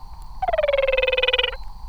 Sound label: Physalaemus jordanensis